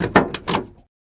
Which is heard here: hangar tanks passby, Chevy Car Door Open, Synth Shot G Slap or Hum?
Chevy Car Door Open